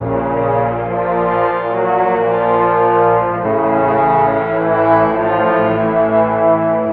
horns tragic; Sad; Loops

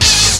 skid, stabs

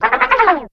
running cartoony

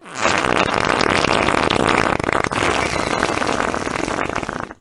fart medium; Farts

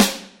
Snares, Real

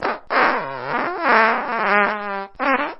fart long

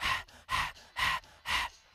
breath LOOP; ooh ahh

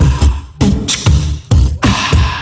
chase the cat, Beatbox, Drum loops

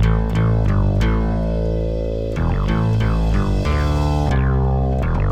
keys, Sad Loops

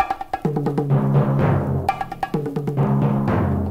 boom boom boom, Drum loops

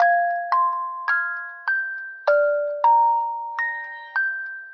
keys magical box

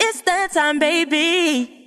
"it's taht time baby" SUNG

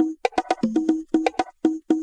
spring bong, Drum loops

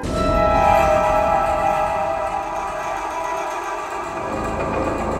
horror sting

